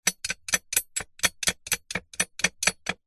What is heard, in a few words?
industrial screw in metal fixing turn by hand finger flick